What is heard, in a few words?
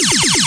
Electro FX; Ueb HE Electro FX